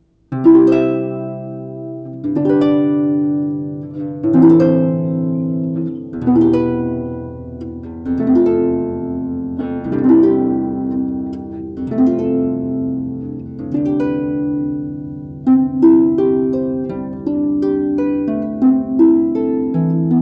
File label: CANON IN C, harpist